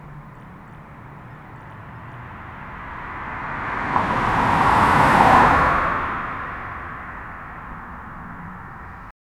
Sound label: car drive by